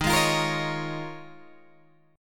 D sharp 7th Suspended 2nd Suspended 4th, Guitar, Open G